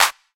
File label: sharp clap D key; single hits one shots